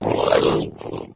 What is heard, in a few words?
pain; halo; flood